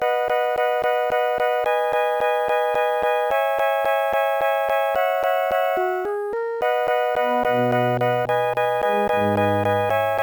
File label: fifties song; The Music Studio